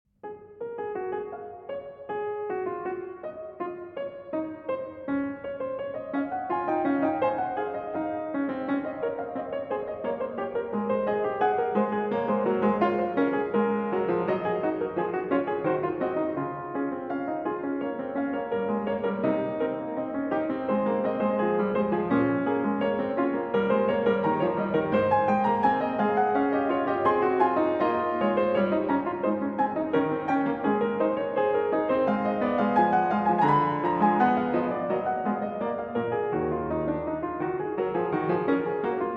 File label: Fugue in C-Sharp Major; Prelude & Fugue in C-Sharp Major, BWV 848